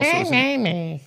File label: harpy sound